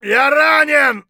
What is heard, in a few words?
hurt light; Escape from Tarkov